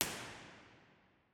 st albans, lady chapel; cathedral; ortf

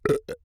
burp; OPENAIR; Anechoic

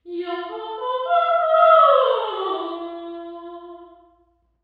sing, central hall; university york; row 1; OPENAIR

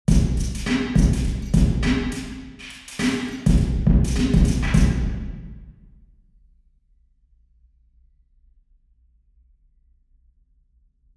drum, church; St Margarets; national centre early music

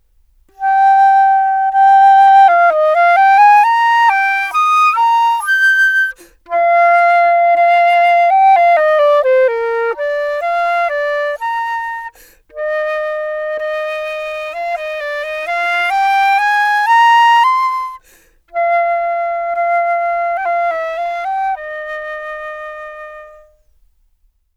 flute music, Anechoic; OPENAIR; acoustics and psychoacoustics book

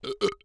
burp; OPENAIR; Anechoic

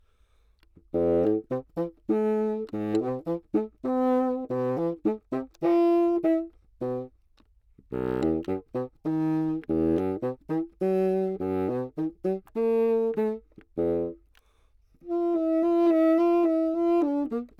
piece, classical bassoon, Anechoic; OPENAIR

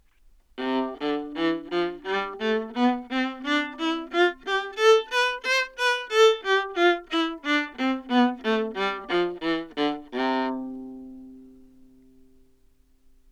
modern viola c major bow lift, Anechoic; OPENAIR

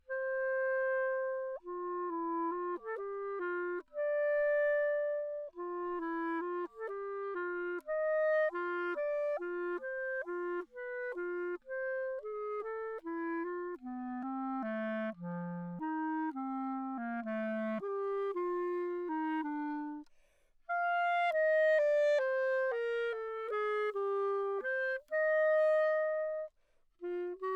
piece, romantic clarinet bb, Anechoic; OPENAIR

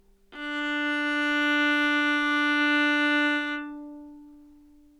modern viola open d, Anechoic; OPENAIR

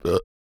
burp; OPENAIR; Anechoic

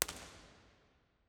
baptist nashville far wide; 1st baptist nashville

